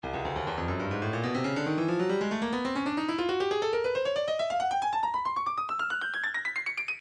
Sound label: Percussion Bongos, midi; Stylus